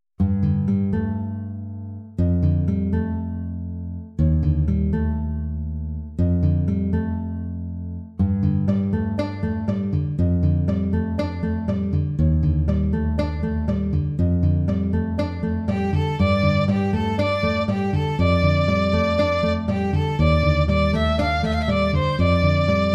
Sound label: guitar; 独り荒野を往く; てきとーオリジナル曲。荒野っぽく。